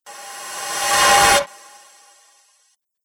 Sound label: Slow Tube Whoosh; Whoosh Sound Effects